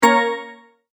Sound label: multimedia tone start; Sound Design